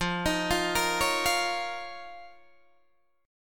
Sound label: F 7th Suspended 4th Sharp 5th F, Guitar, DADGAD